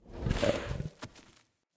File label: Wolf Steps Run Start; Animals; Foley Actions